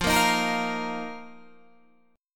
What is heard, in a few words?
F 7th Suspended 4th F, Guitar, DADGAD